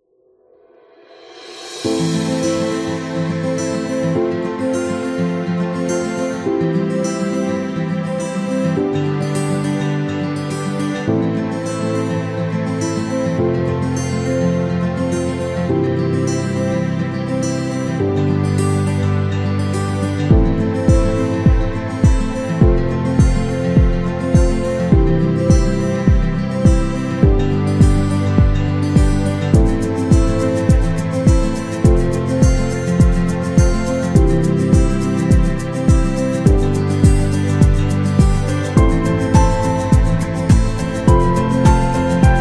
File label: Guitar and Piano; happy music vol 5